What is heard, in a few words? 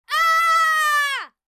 Child scream